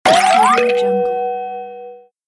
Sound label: cartoon ui dice